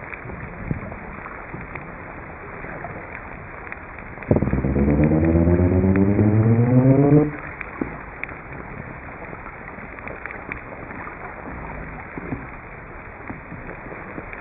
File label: Unknown sp Single Call